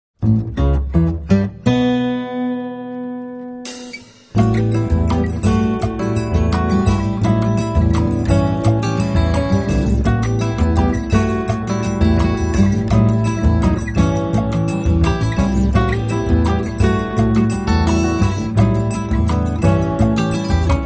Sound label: soul e bossa